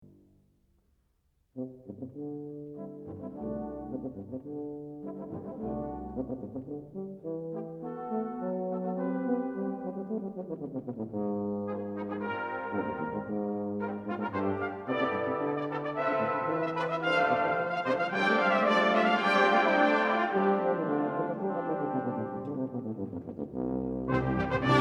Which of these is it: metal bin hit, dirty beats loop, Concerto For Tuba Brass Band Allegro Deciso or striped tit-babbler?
Concerto For Tuba Brass Band Allegro Deciso